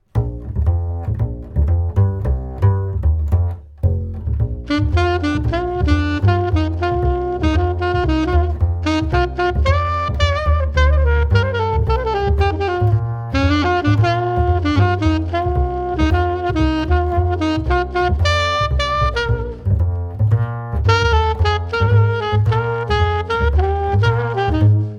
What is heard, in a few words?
solo sax happy